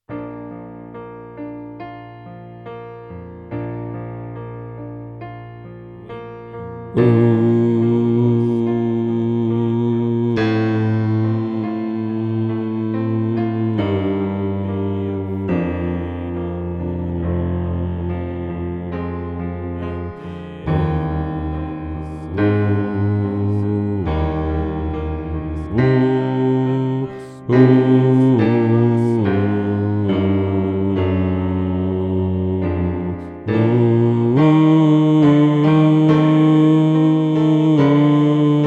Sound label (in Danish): Bas; oevefiler